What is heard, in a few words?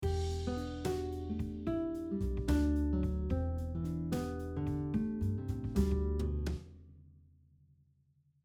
Intervals example, E minor scale using descending 6th intervals, guitar; harmonizing a melody is using 3rd and 6th intervals example